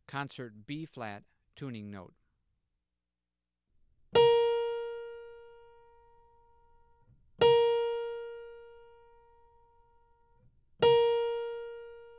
Tuning Notes; James „Jamey“ Aebersold Play-Alongs; All-Time Standards

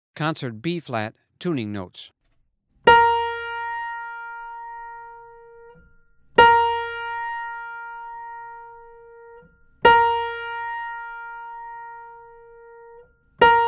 Tuning Notes; James „Jamey“ Aebersold Play-Alongs

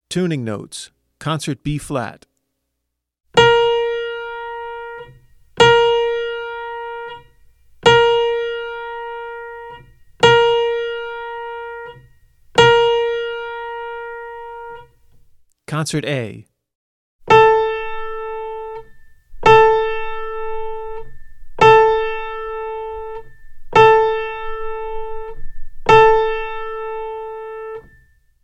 Tuning Notes; James „Jamey“ Aebersold Play-Alongs

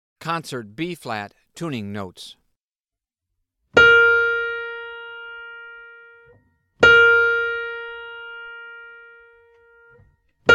Tuning Notes; James „Jamey“ Aebersold Play-Alongs

